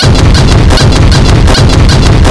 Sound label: stuck to enemy jelly